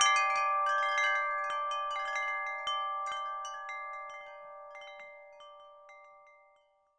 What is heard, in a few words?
WIND CHI; AKAI S6000 CD-ROM; WorldSounds; STRUCK CHMES